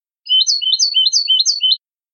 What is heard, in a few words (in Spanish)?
mecida